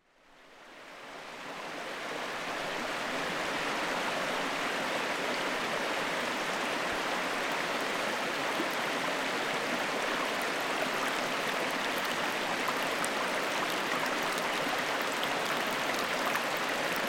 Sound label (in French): cincle